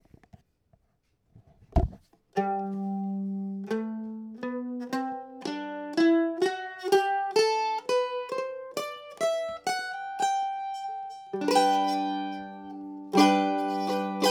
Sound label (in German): Klangbeispiel Mandoline; di Mauro